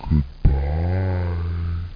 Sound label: Shutdown